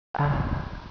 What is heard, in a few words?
Ahhhhh…; Dubbelmoral!